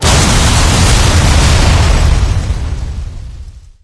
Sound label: Vehicles; Unreal Development Kit; SFX Vehicles Scorpion